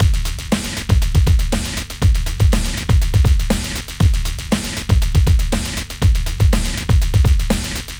Hard HipHop Drums; Pro Tools Essential Loops; Digidesign

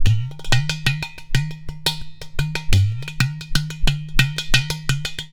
090 Hip Hop Udu, World Percussion; Digidesign; Pro Tools; Pro Tools Essential Loops

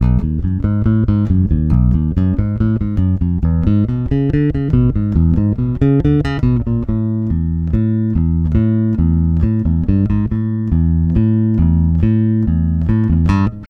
Country Bass Bridge; Digidesign; Pro Tools Essential Loops

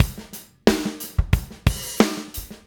090 Pocket Drums; Pop Rock; Moderate Tempo; Pro Tools Essential Loops; Digidesign

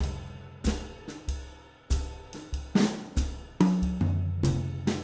095 Tradition Jazz Drums; Pro Tools Essential Loops; Digidesign

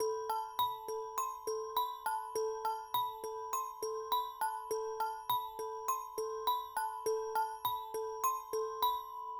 Latin HipHop Bells A min; Digidesign; Pro Tools Essential Loops

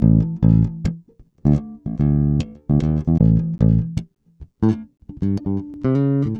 075 Dance Pop Bass A, E-Bass; Pop Rock; Pro Tools Essential Loops; Digidesign

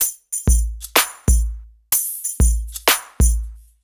Dance Beat; Pro Tools Essential Loops; Dance DJ; Beats

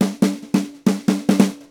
Country Drums Intro Fill; Digidesign; Pro Tools Essential Loops

